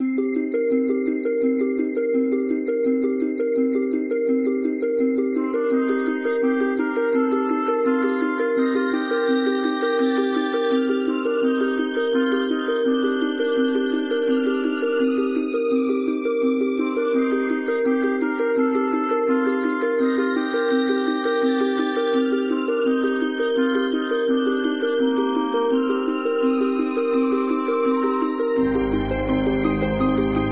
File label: dungeon; MapleShrine; mkxp; gameasync